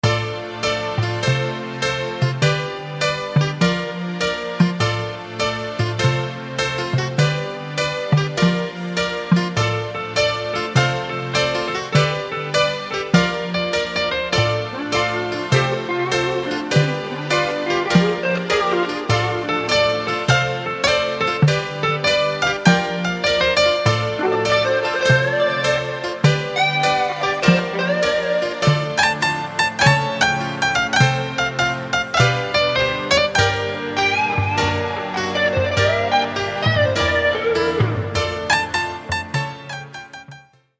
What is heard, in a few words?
Honky-tonk Blues